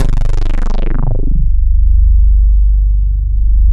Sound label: reso pad; Sound Gallery - Charged